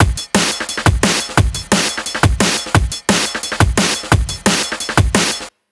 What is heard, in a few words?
D&B Drum Loop; neuro